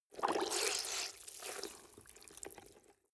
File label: clothes water wring hard